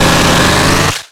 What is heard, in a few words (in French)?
Cri